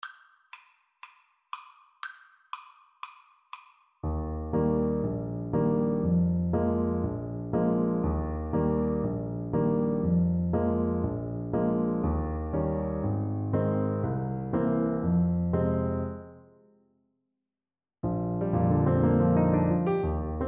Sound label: narcissus, Saxophone